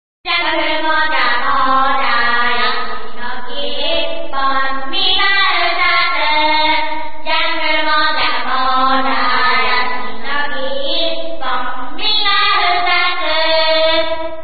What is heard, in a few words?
jungle